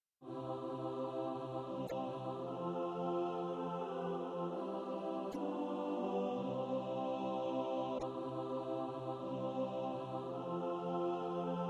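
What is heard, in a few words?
alt, practice music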